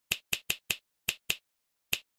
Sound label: dry, Snap